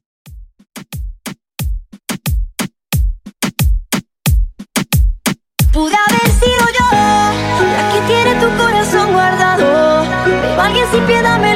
In Outro 90Bpm

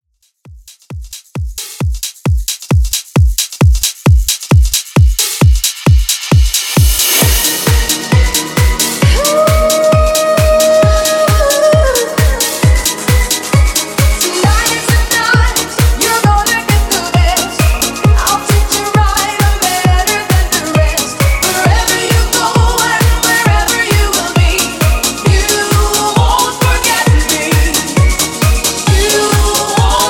House Redrum 133Bpm; remixplanet

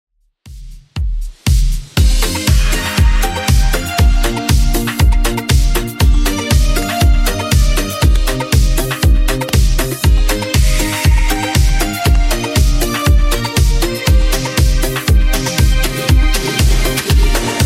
80s Redrum 119 bpm

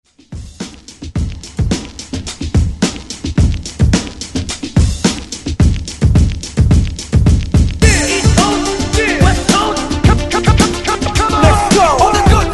80s Hype ReDrum 108 Bpm

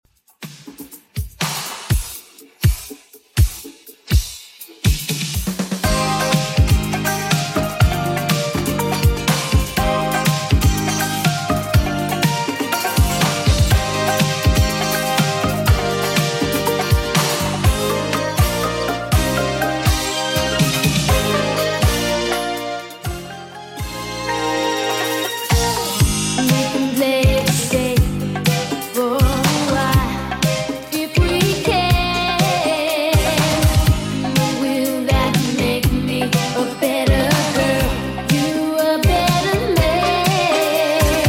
80s Redrum 122bpm

